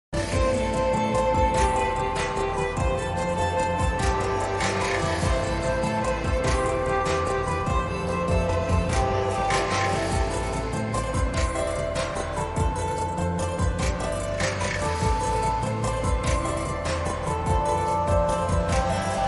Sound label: song bgm